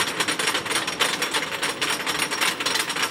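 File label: wood rc lift, roller coaster; cp blue streak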